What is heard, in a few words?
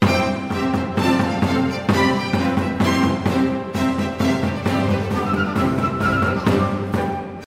Tambourins; Rameau